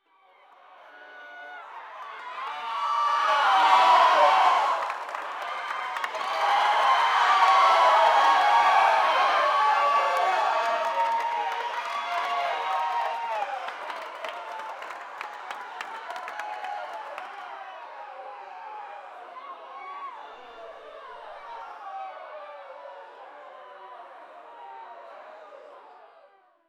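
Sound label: crowd is quiet, wrestling; wwe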